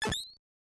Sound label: Hard cover hit; Gradius; MSX; enhanced SCC